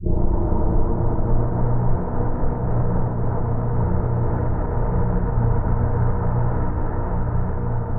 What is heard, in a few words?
Canyon Drone; Instruments; Laboratory